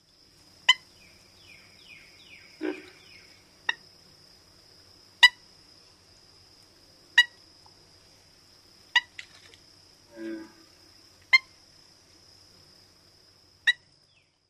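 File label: kik calls, Purple Gallinule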